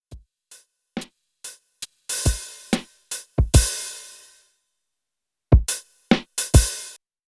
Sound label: lofi drum